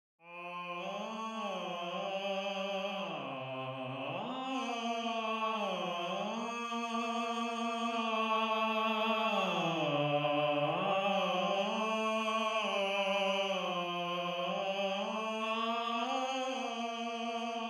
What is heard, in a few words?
Tenor, counterpoint